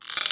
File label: Zap